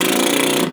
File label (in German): LIPPBLAS; Xsample VOL-3; STACC+FX